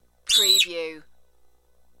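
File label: Little Girl Shriek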